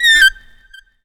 SAX RIF; E-Lab; X Static Goldmine 2; akai

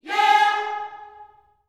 "yeah" G#4, Gospel; Spectrasonics; Vocal Planet Vol.1 Gospel; akai

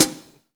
HH ROOM; E-Lab; X Static Goldmine 2; akai

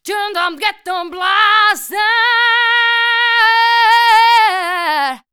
ROCK ME, Voice Spectral

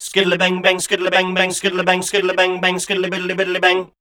SCAT #F, Voice

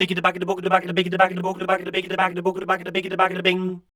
SCAT, Voice Spectral

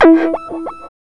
SYNMOV; E-Lab; X Static Goldmine 1

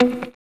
GTR PIK

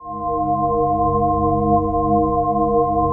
PAD, ATMO PADS; Best Service; XX Large

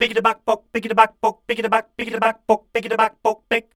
scat, voice

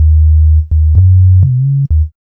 B LOOP; E-Lab; X Static Goldmine 1

